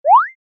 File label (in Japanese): ヒョイ コミカル